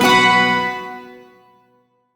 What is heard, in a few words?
Shawzin Chromatic Chord; Warframe; Duviri